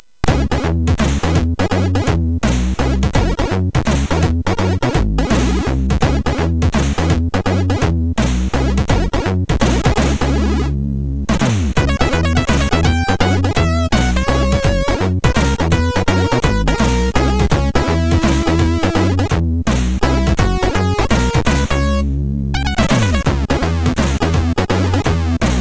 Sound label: Instruments, sid MOS6581R4